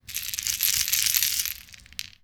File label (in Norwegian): rasle